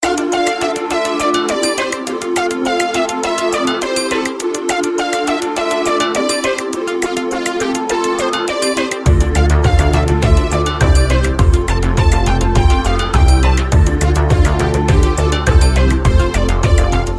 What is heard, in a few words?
Techno Dance Mix